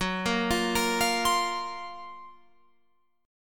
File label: F sharp Major 7th Flat 5th, Guitar, Open F; F#M7b5 Chord; arpeggiated; 18,17,17,17,20,19